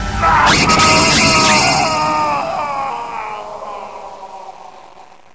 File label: growl; freddy